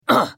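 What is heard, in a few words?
Hit, Khajiit female; The Elder Scrolls III - Morrowind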